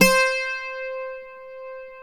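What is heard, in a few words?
Bouzouki C4 left; AKAI S6000 CD-ROM - WorldSounds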